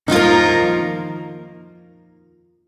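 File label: Shawzin Pentatonic Minor Chord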